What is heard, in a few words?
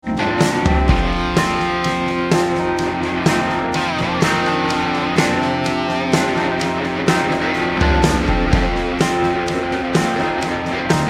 Slide Guitar Recording Rock